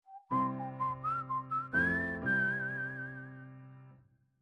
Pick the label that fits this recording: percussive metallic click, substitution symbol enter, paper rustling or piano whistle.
piano whistle